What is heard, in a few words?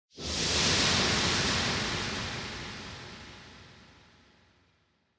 Scene Flashback Long